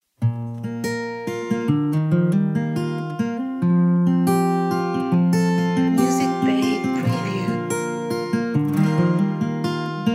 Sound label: Inspirational Acoustic Folk